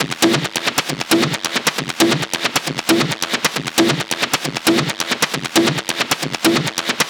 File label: Perc Loop 135, Techno